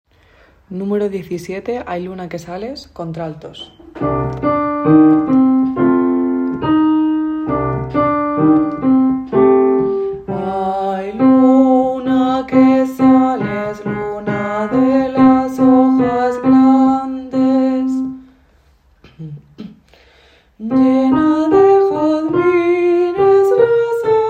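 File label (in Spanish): luna que sales alto; Bodas de Sangre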